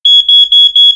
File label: Alarm